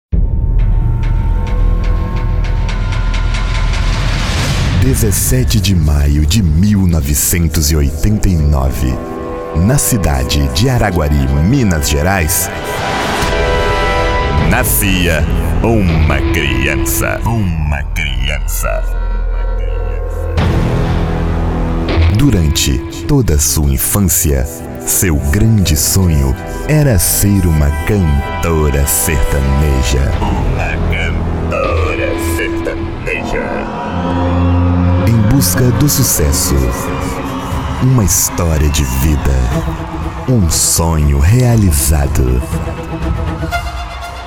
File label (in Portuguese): vinheta de abertura